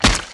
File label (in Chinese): 牛皮糖溅水; 武器音效